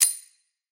confirm small, menu

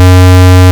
OB SQ, Synths; Multisamples; Ableton Live 12 Lite; Core Library

